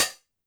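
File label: Hi-Hat Open Hard Short H; YAMAHA RM1X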